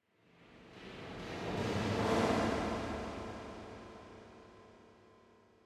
echoes; thief 2014 gamerip

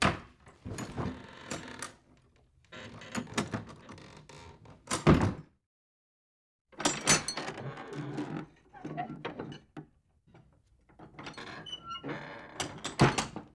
Door Wood Solid Open Shut

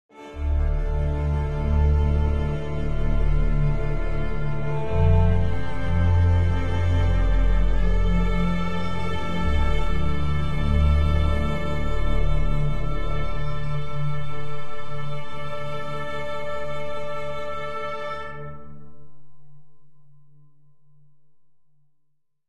Outro Recreational Vehicle; the walking dead season one

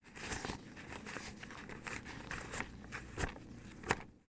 SFX Illegal Money; This Is the Police

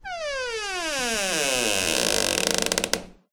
door creak long; SFX; Environment; MONO; Doors; ProSoundCollection v1 3

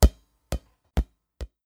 BALL BOUNCING; don t be afraid the first toy

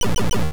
Coin; Arkanoid II; NES

